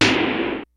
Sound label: G-FUNK KIT, DRUMS; Kid Nepro; Elite